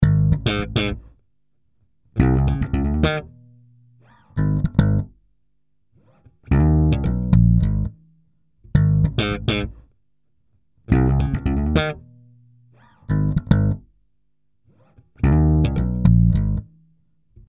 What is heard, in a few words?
Electric Slap; Apple GarageBand 2; Apple Loops